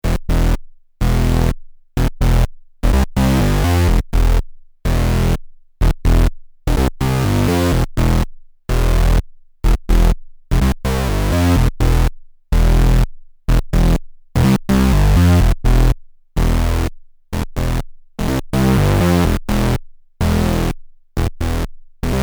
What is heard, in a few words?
Bass; LAMM RawArtistic PhilKullmann